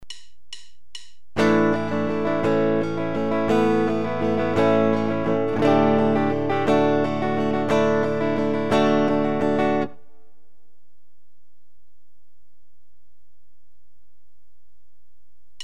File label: Sus chord and add chord progressions, guitar